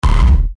Hit Static Distorted Bass, Static Distortion Hiss; Production Element Imaging Element Accent Transition; Blastwave

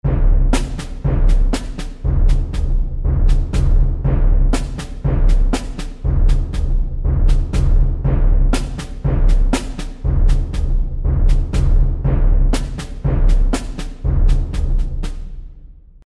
Epic War Drums Seamless Loop; Battle Sound Effects

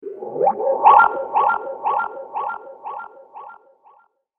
Weird Creatures; Science Fiction Sound Effects

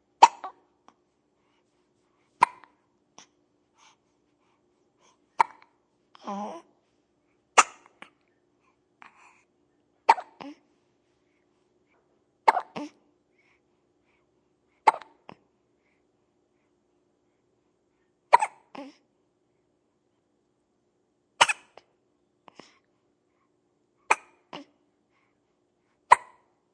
Baby Hiccuping; Baby sound.; Hiccupping

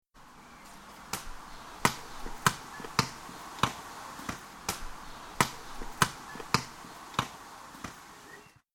Street Ball, Bouncing football in street; Bounces; Objects

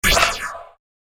Laser Rifle; laser gun; Laser Gun Sound Effects; science fiction sound effect

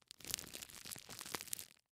Plastic Bag, Plastic Bag Sandwich Bag Movement; Materials; Household; Blastwave

